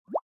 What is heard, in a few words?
Keypress Standard; DEXP; Ixion-M350-Rock